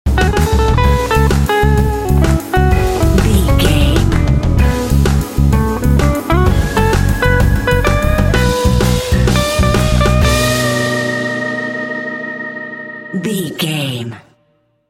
juicy blues jam sting

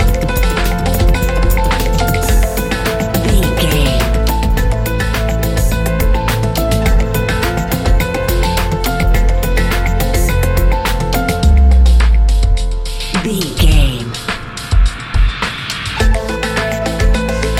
A cool piece of electronic music

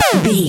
retro laser gun shoot

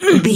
voice female effort quick action

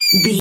voice fun mouse

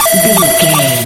retro alarm siren loop

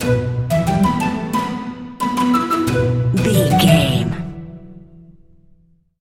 music sting short groovy flute

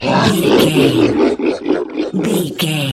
troll monster laugh

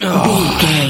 voice male growl

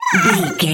goblin fairy hurt pain choke

